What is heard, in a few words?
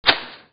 paper